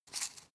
herbs shake single; berufe; gastronomie; soundpool

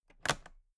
toolbox latches close regular; industrie wirtschaft; handwerk

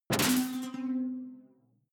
sci-fi alien bounce detuned bright; swooshes-swishes

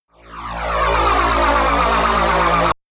shot uprising dark shine, games; pulsieren

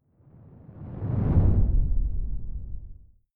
whoosh simple low grainy; swooshes-swishes

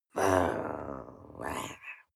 witch growl soft; kreaturen

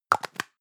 plastic can lid screw close hard long; objekte; dose

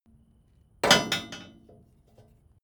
metal impact short loose; hits explosionen; metall

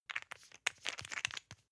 toothpaste close rattle hard; haus-garten; badezimmer

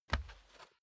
step barefoot wood plank sneak single left; Footsteps; schritte

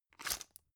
drug pull out hard; berufe; arzt

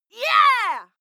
female shout yeah; menschen

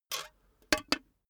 cash box metal lid close hard; maschinen; soundpool

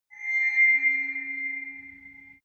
galaxy train whistle

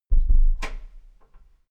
closing door gently

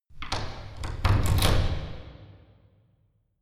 church door key

